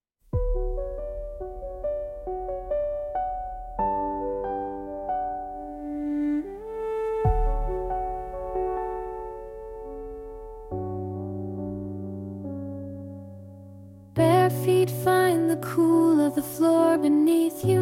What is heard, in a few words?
lullaby, name songs; niall